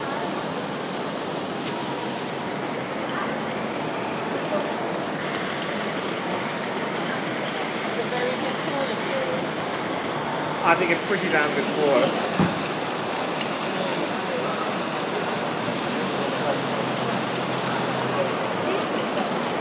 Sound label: brighton's friday sounds